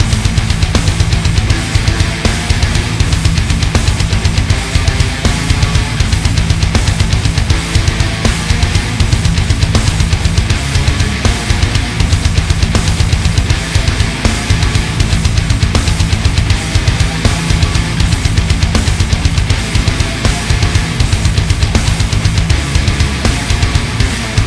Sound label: Rock; Barotrauma; DynamicEuropa